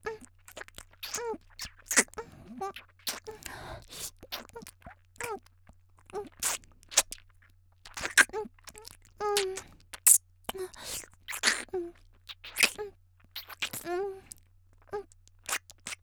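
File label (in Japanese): （少女）「フェラ」控えめ, 元気な少女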